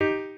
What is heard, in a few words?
piano